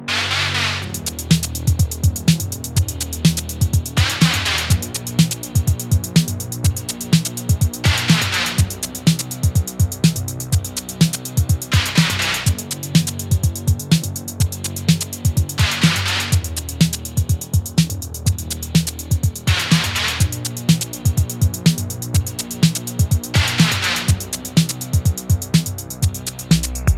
Instrumental

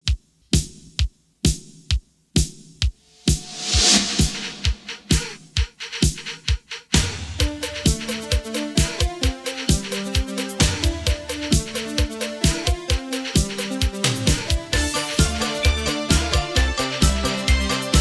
INSTRUMENTAL

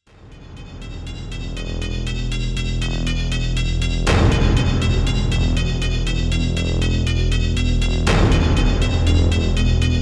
Techno

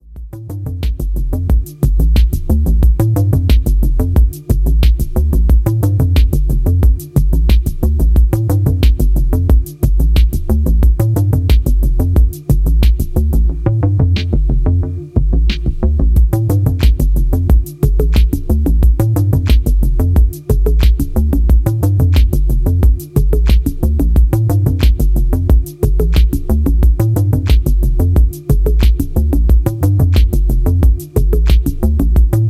Electronic Rhythm

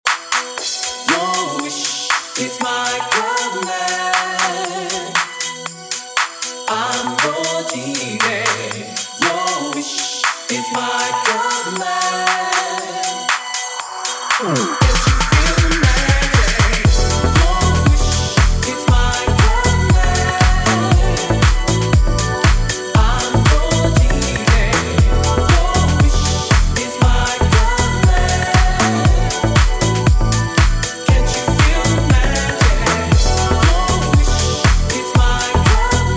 Dubstrumental

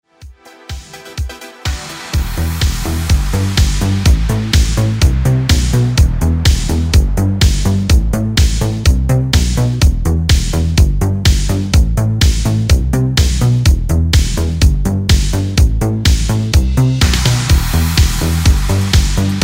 Instrumental remix 125 bpm

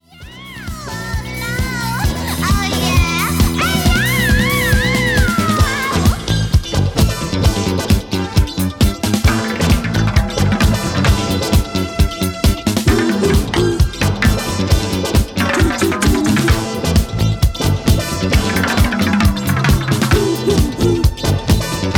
drums records

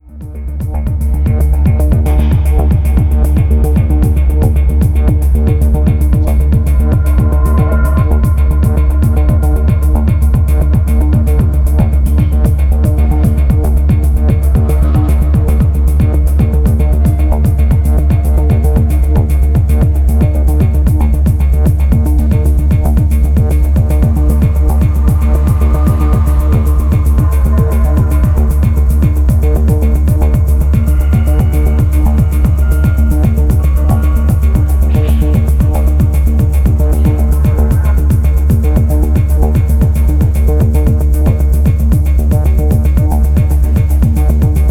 Tapeloop

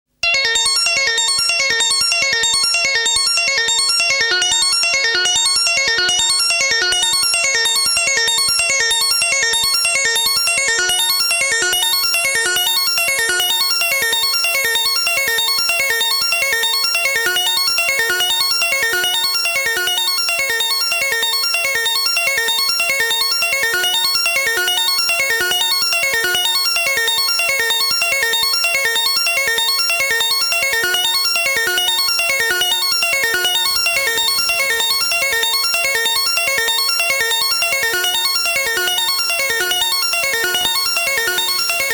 Arpeggio

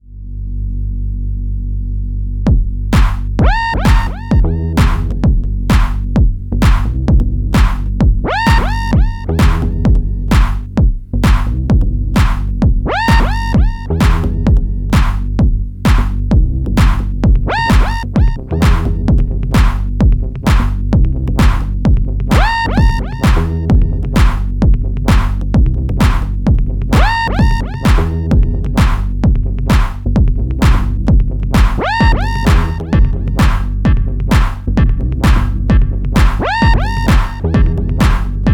Limp Funk

